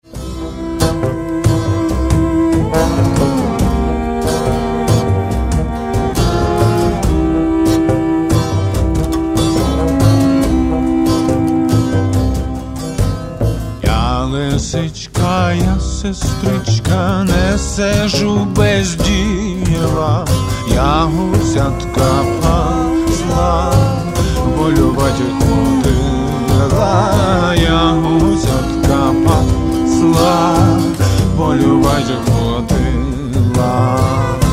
Bluegrass Band

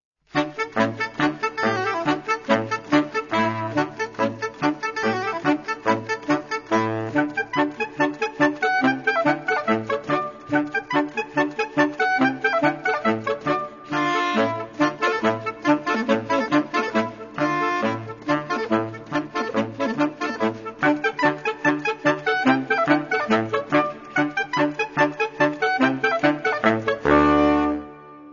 sax

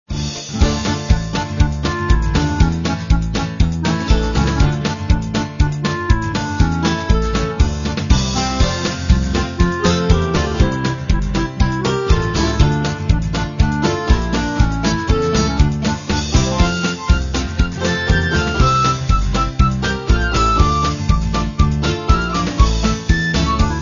karaoke, Ukrainian folk songs

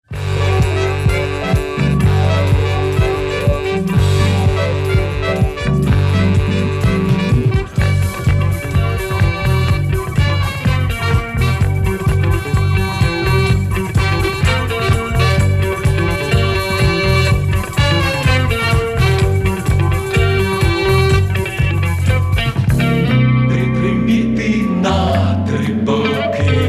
vinyl

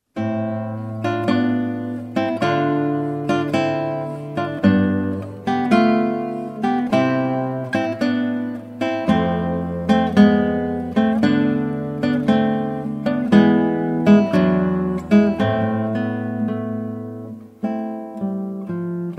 Romantic Guitar

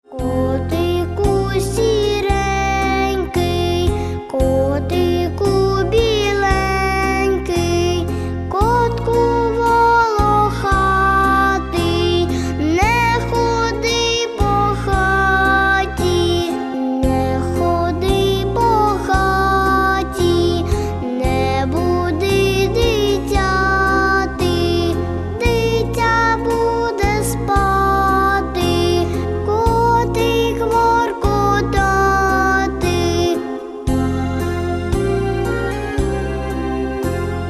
Karaoke; ukrainian folk songs for children in modern arrangements karaoke

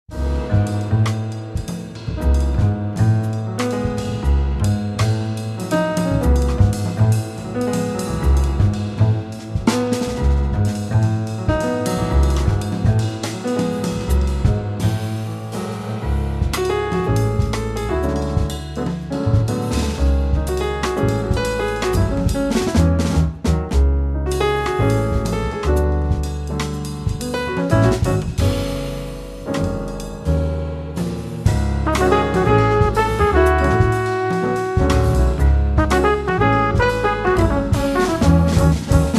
Acoustic Quartet

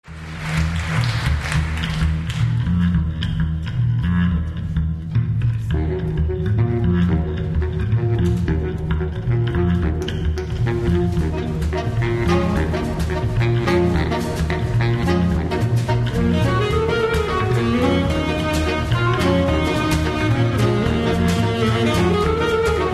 sax quartet swing

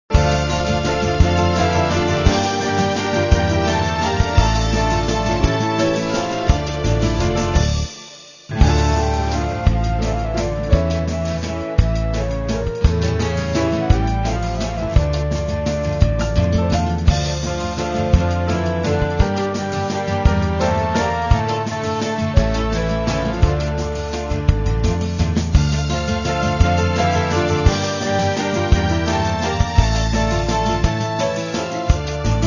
karaoke, Ukrainian folk songs

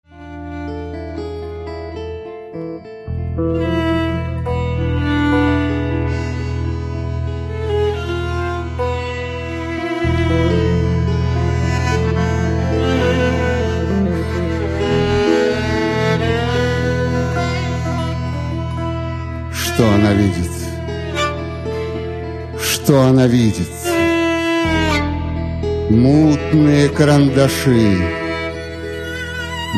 orchestra

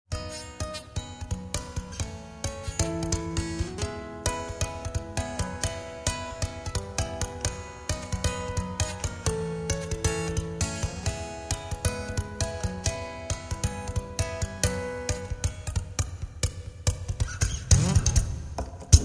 guitar live

